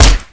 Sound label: Deagle